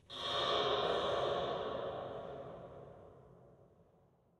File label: whoosh; OneShot Fading Memory